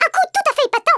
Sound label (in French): joli coup; worms